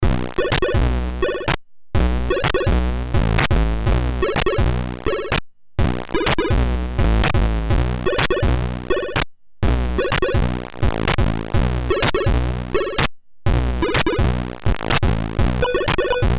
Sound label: music